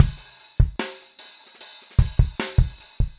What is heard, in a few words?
075 Pop Busy Ballad Drums; Pop Rock; Slow Tempo; Digidesign; Pro Tools; Pro Tools Essential Loops